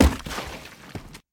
stalactite hit; casualtiesunknown

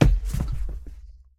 body Fall; casualtiesunknown

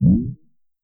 thirst up; casualtiesunknown

